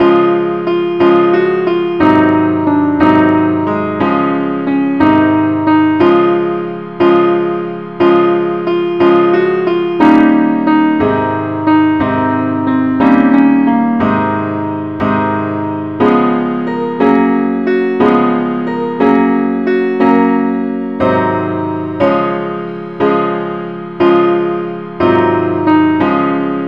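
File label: angel voices simple accompaniment key Des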